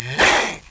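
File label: rat attack; Renaissance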